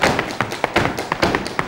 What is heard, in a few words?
STEP + CLAPS, MSTEPS+CLAPS; Best Service; World Colours